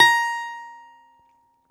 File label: BALALAIKA, Russian Strings